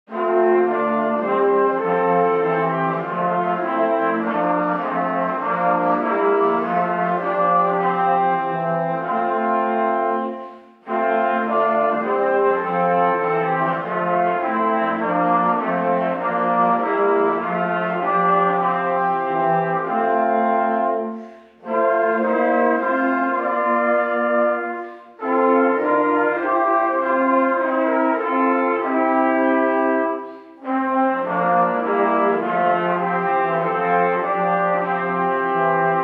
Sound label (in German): 7 Instrumente, posaunenchor; Lobe den Herren 316; Posaunenchor Wichern